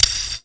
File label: SCISSOR, Anime Sound FX; COMIC